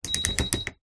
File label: household clothes dryer drying options dial turn beep